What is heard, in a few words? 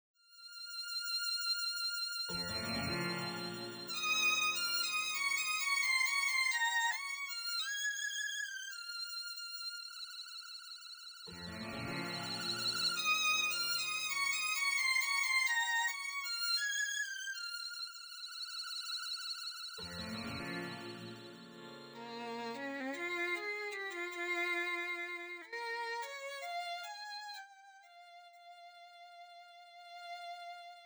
violino; arranjo